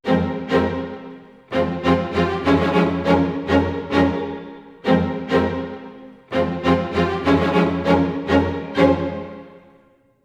100 strings lo+mid, Orchestral arrangements, 100 f min